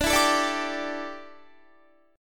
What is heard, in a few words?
D Suspended 2nd Suspended 4th D down Guitar